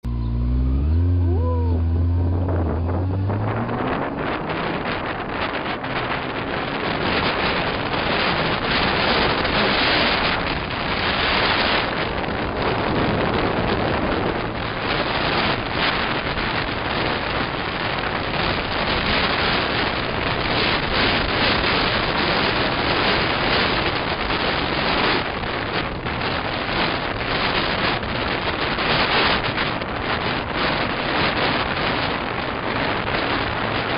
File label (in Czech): na motorce